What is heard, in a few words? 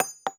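bullet case bounce 50bmg wood hard; gmod; viper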